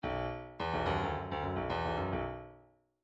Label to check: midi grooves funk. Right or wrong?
Right